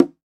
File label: CONGA MUTE; World Combo